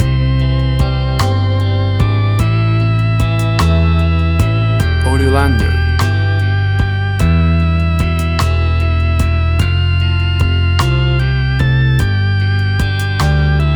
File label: 60s Surf Ballad